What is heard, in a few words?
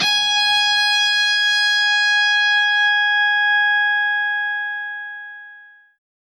g sharp, guitar